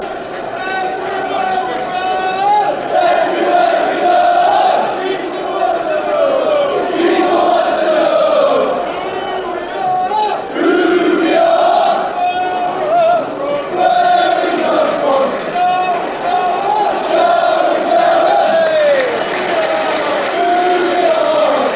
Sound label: barmy army